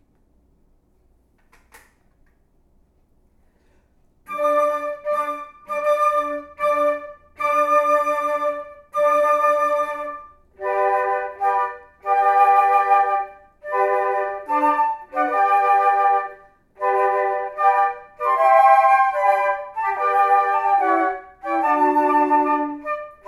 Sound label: bridal chorus, flute